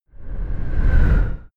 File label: sci-fi vehicle train passing fast short; raumschiff